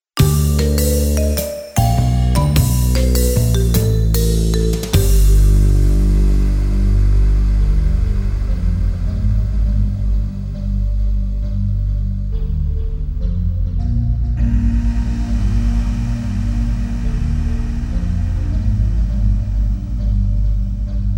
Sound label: Room Music; Dragon Ball Z Best of - Volume 4